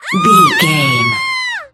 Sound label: voice female scream